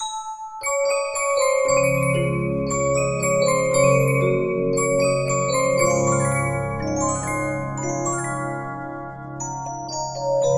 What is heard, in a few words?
Regina, 15inch disc musicbox